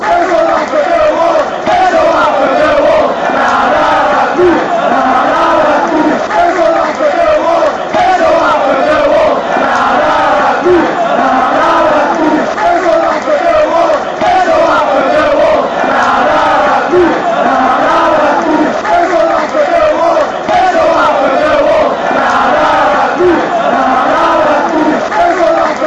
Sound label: lets all laugh at millwall, chants